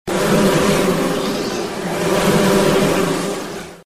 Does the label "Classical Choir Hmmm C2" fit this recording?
No